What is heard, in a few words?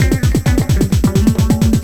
groovethang bpm130, funk